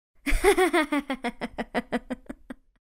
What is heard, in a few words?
Teenage girl laugh